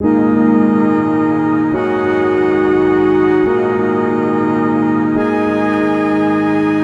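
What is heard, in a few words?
Melody Loop D# minor 140BPM, Dubstep; Murk; Ghosthack; Dubstep Sounds 2025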